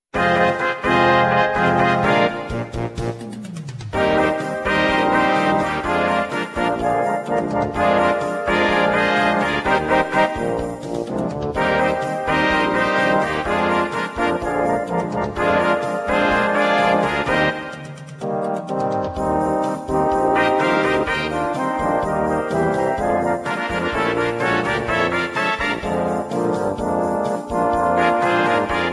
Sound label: CHA CHA